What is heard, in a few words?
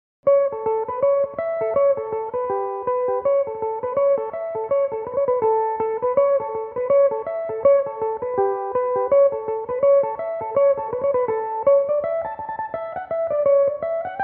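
reel A mixolydian, irish mandolin, tunes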